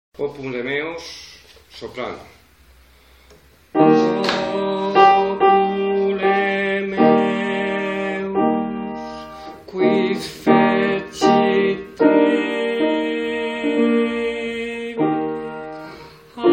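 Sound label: soprano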